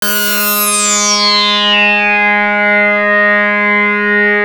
Anasound Long hi G, Industrial Analog Single Notes; Sonic Foundry (Sony Creative Software)